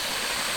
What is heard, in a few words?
door mid; Digital Paintball 2